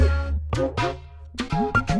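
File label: Music Base 120; From Dust; ps3; xbox 360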